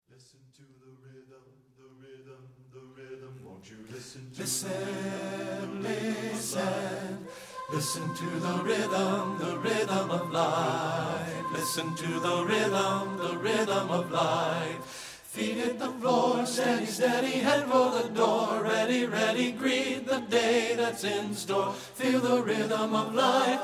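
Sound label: tenor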